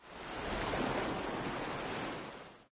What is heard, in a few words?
victory waterstep; smite; poseidon